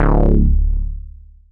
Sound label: FROG BASS; Masterbits; Dance Trax